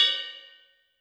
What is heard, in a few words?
Ride Cup Light, YAMAHA RM1X